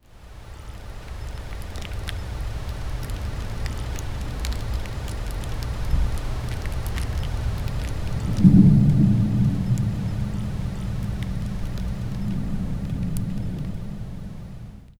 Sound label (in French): un orage clate soudaineme, door slam